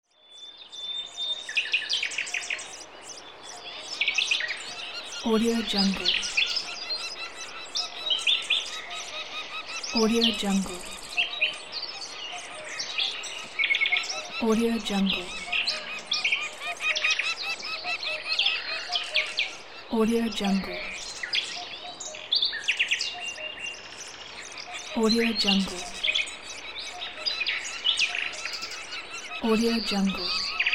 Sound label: birds